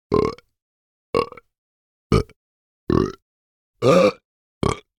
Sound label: burp short forced; sonniss gdc